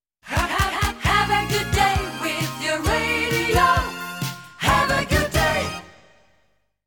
Have A Good Day With Your Radio, Have A Good Day

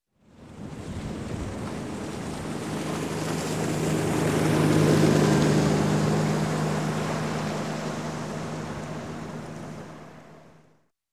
Boat Outboard, 115 Hp, Pass By, Slow, Marine; Sound Ideas; Series 2000

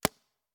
Paintball Gun; Sound Ideas; Guns

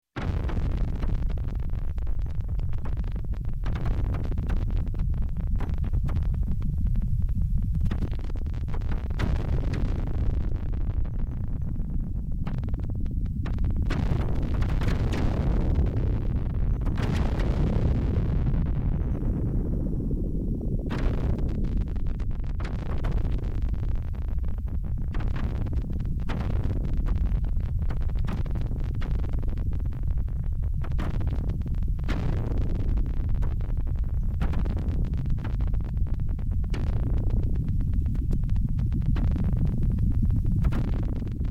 Space Explosion, Many Blasts, Electronic; Sound Ideas 2000 Series

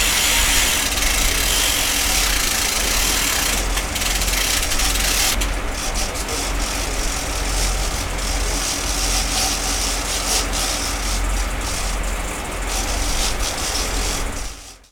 boat fishing; best service Blue Box; sea traffic

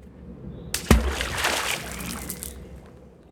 water splash; best service Blue Box; water fx

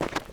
dirt; firefight

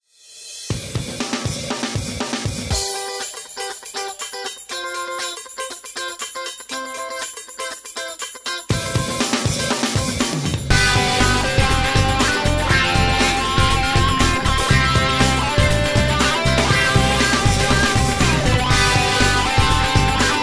ROCK STND, Royalty Free Music; podcast production toolkit

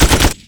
Machine Gun Burst; Weapons

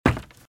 Footsteps - Wood - Creaky - Run - Right

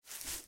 Snow Ground; Blind Quest - The Frost Demon

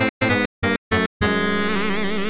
lose; Quentin C's Gameroom

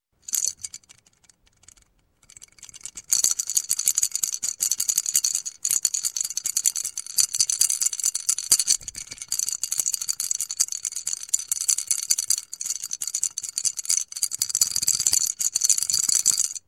Toy Rattle Toy, Rattling; Sound Ideas 2000 Series

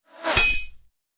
Bullet Impact; Sound Ideas; Guns

